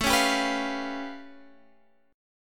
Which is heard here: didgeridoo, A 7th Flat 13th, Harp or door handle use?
A 7th Flat 13th